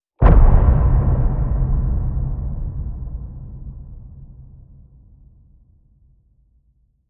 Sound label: BOOM